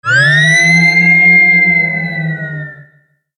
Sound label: sweep